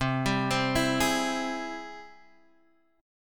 G sharp Augmented Major 7th C

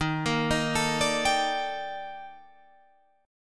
D sharp Major Sharp 11th; chordshape

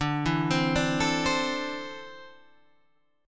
D 7th Suspended 2nd down Guitar Standard; D7sus2 arpeggiated

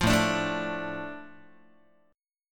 A Suspended 4th Sharp 5th, A, Guitar; Asus4#5 strummed; JGuitar

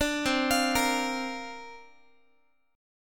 C 7th Suspended 2nd Suspended 4th C down Guitar; C7sus2sus4 arpeggiated

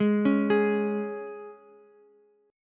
C sharp 5th, Ukulele; arpeggiated; C#5; x,8,9,11; JGuitar

